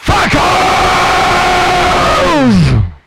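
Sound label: vocals, Jungle; E-Lab; XTC Files of Jungle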